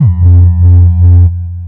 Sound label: sine ting